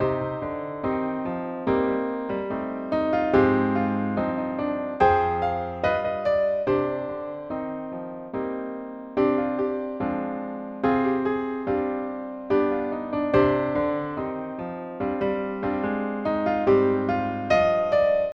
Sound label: accomp; battle of jericho